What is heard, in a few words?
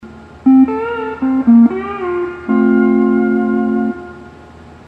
hawaiian piece